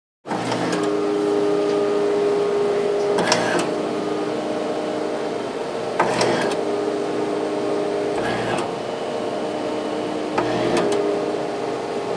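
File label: robotic assembly line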